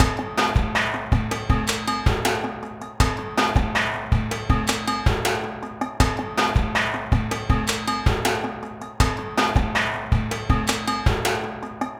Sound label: junkyard rhythms, drums; Sonic Foundry (Sony Creative Software); Vital Drums